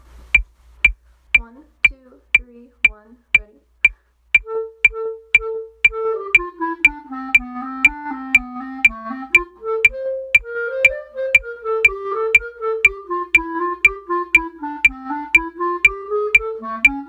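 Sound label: baritone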